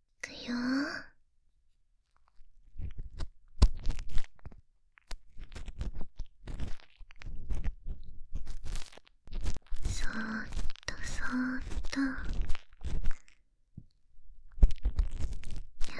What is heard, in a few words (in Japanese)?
耳かき、ぼん天部分のみ通し; お姉ちゃんに耳かきさせて～2人きりの癒しの一日～; 本編各パート通しトラック